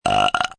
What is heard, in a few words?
Burp Open Mouth, open mouth burp (human voice); Burping Sound Effects